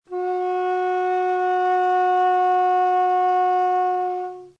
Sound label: F sharp 4, flute; classical